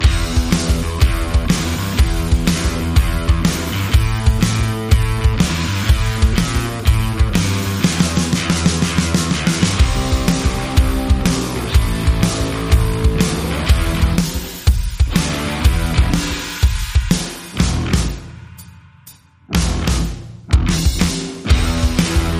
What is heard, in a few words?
Motor Engine Blues, Underscore